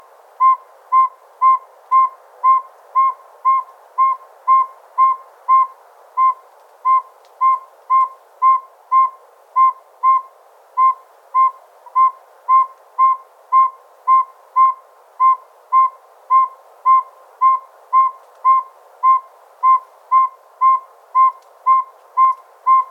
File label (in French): petite nyctale, chant